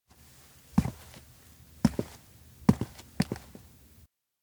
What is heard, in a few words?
steps outdoor wheatfield depart; anemoi